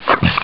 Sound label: Pred Jump; svencoop; avp2pred